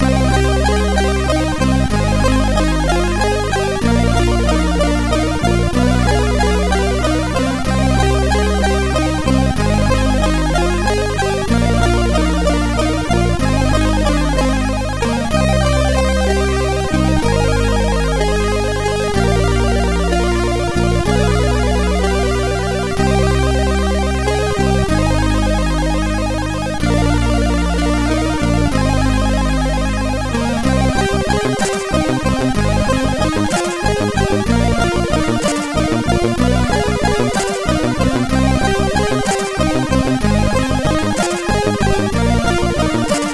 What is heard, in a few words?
Great Waltz, MOS6581R4